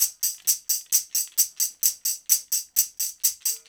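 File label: TAMB, tambourine; Drumdrops In Dub VOL-1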